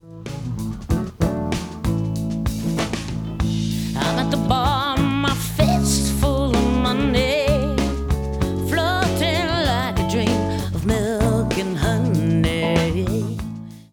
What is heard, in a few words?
vocal good